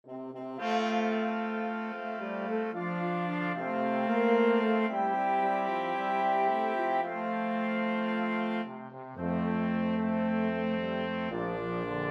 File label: flexible mixed ensemble 5 players